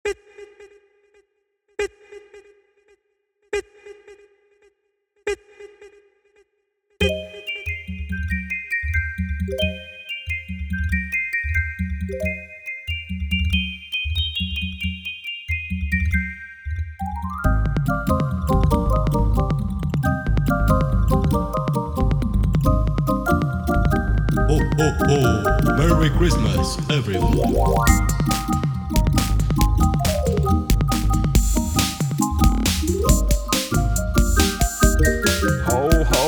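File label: Jingle the Bells, drumcircle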